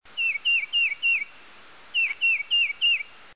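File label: tufted titmouse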